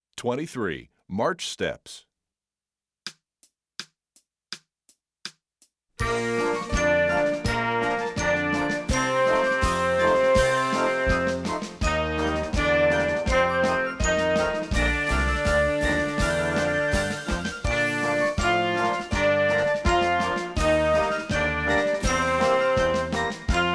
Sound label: march steps